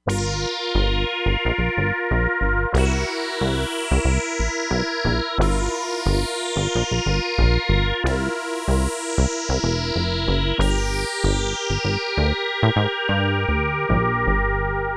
FUNK TOUCH - RESONANCE SWEEP, Crumar Bit 99; Factory Programs

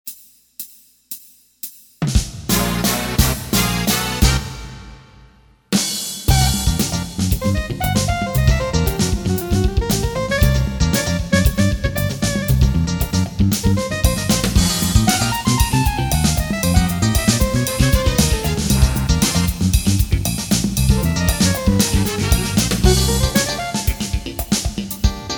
Very Funky, Casio CTK-601 Rhythms

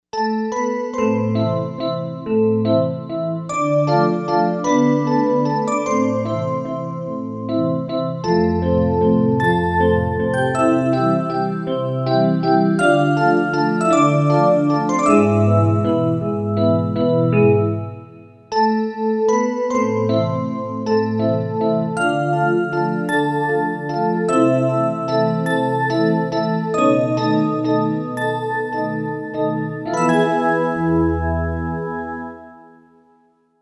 Bellsynth, Korg M1Rex; Factory Patches

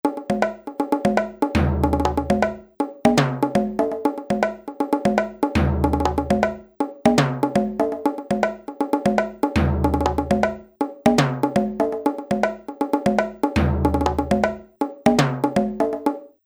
Percussion Part

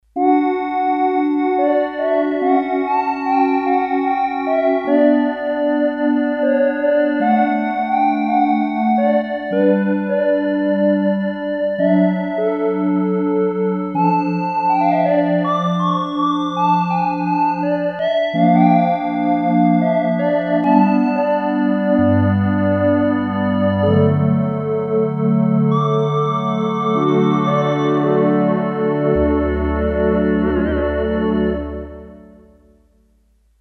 Korg DS-8 Sqr Wave Pd; Factory Patches

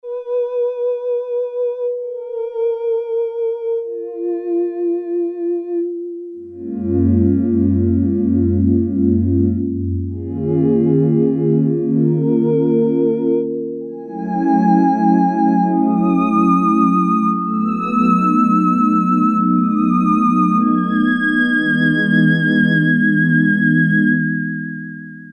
VOICE, Yamaha DX7

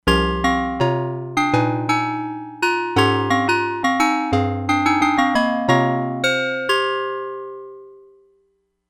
DIGITAL BELL, Crumar Bit 99; Factory Programs

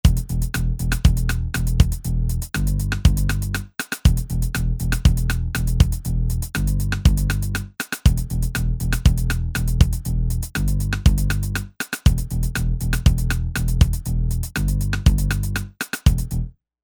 Drum Bass

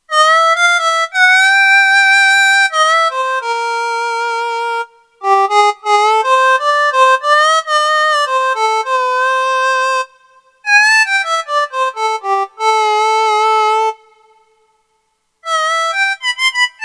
FM Harmonica, Kurzweil K2500X; Factory Programs

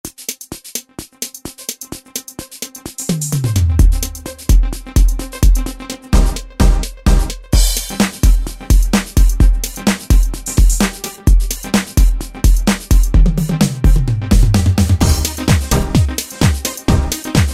Electro

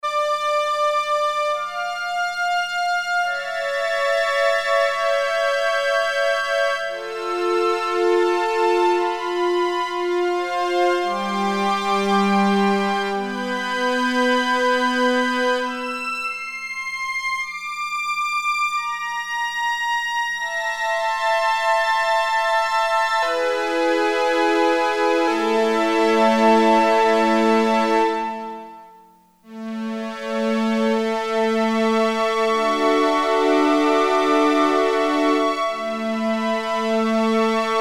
HI STRINGS, Roland Jupiter-8; Factory patches

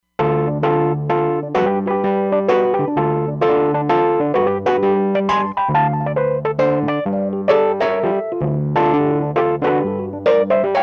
AMP EP, Roland Fantom XR

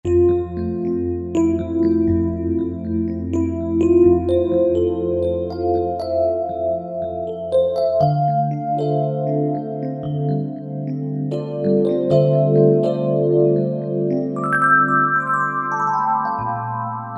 Tremolo EP

